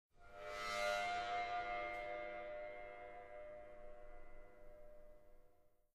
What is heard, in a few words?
stinger; Myst III Exile